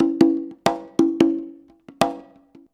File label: CONGA, Modern Percussion Loops